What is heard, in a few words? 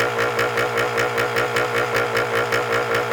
MOTO IDLE; SIMUZENSOUND